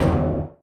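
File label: panels impact small, metal; physics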